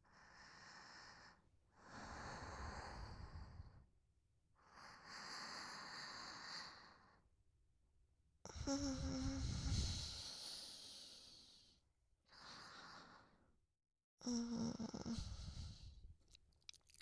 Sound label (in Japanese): 寝息; SEあり